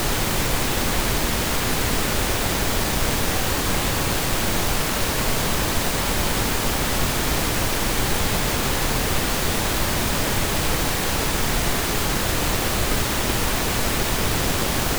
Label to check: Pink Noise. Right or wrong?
Right